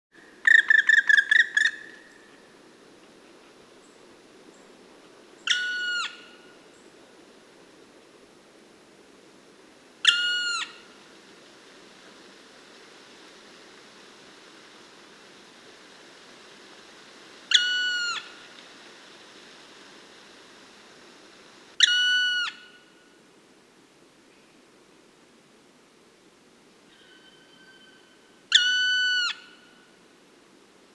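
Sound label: zhelna golos, black woodpecker voice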